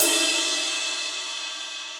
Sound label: Ride Mid Ambient Loud Punch; Drums; Drum Hits; Standard Hits; Ableton; Session Drums Studio